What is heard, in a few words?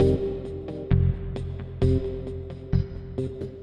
Funky 125-132 Fm7 9; Loops